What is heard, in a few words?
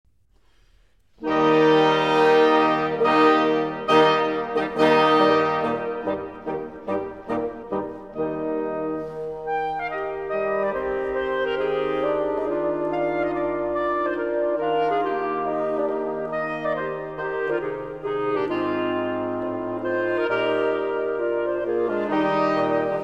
Serenade In E Flat; Mozart; K 375